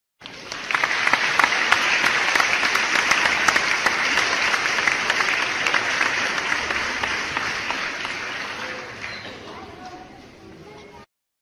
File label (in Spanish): Aplausos Efecto De Sonidos